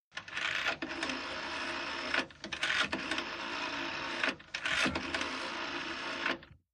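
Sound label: British Call Box Dial 999, pulse rotary dialing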